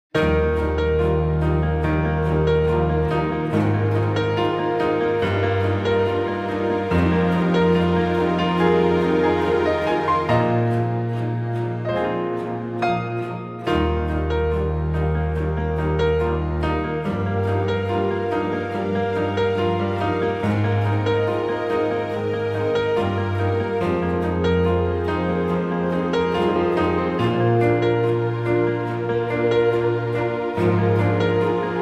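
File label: Eb orchestra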